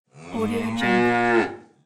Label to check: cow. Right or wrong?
Right